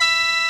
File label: SHEN D#3